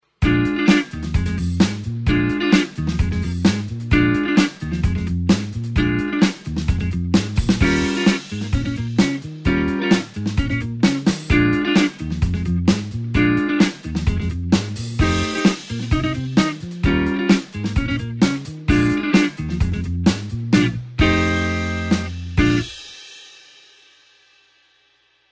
blues 8beat backing note chord

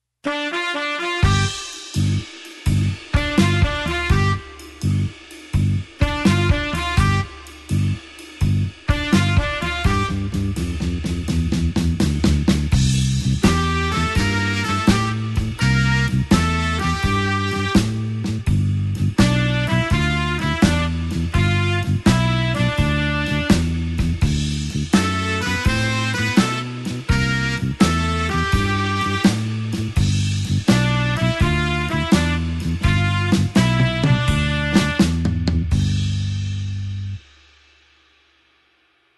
blues horns shuffle G

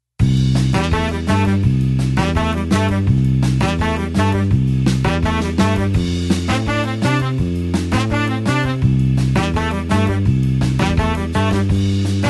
blues horns 8beat C

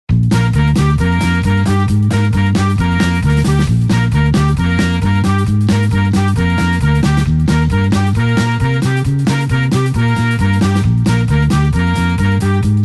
blues horns up Bb 8beat

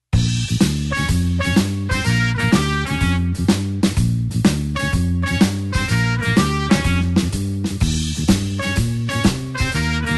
blues horns shuffle Bb

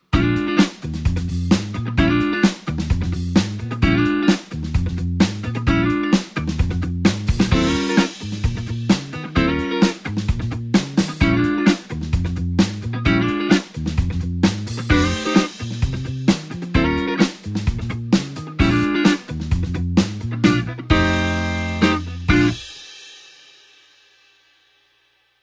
blues 8beat backing chord

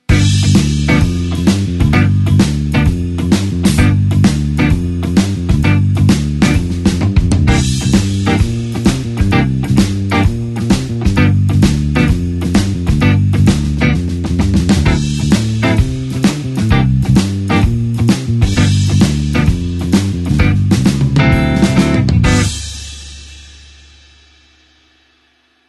blues shuffle basic pattern G

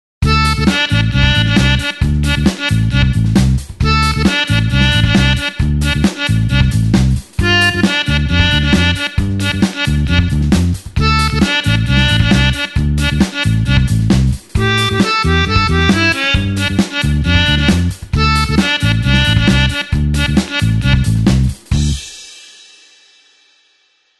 blues harp 8beat D

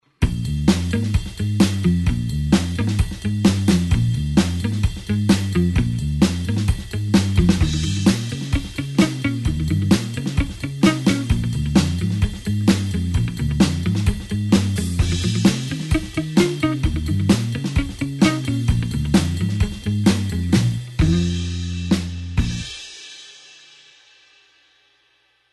blues 8beat backing note

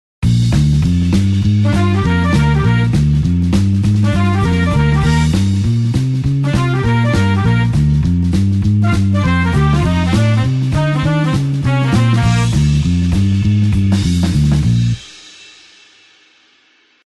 blues horns up Bb

